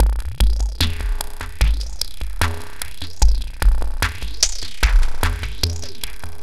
PHASE SHIFT; FRENETIC FRACTAL GROOVES

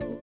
VIBRA; Spectrasonics; Metamorphosis

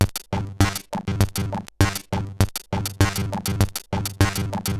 Bent RX17 (100BPM), cyborg beats; musicradar

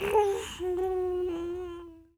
TLK, BABY; AKAI S-Series CD-ROM Sound Library VOL-6

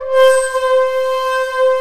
SHAKU C5, Asian Traditions; Soundscan vol.60; AKAI

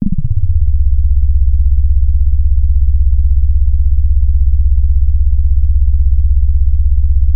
C1, Korg MS-2000; Synthworx

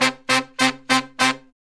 Horn Section

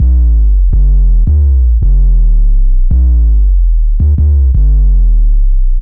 bass loop 165bpm Dm, Drum&Bass; Total Drum&Bass

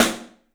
STEEL S, drums; 3D Sound

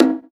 Percussion, House

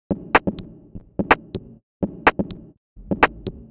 Top 125bpm, House Drumloops; 90 s Sample CDs; Zero-G House Fabrik Drumloops; ZG Drumloops

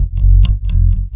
YOKO BASS; Big Beat Samples & More

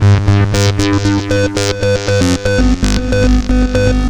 Synth Sequence; Eclectic Electronica

